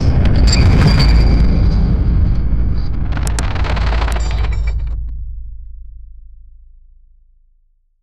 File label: a futuristic glass skyscraper